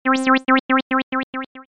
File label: Bzzzzzzzzzzz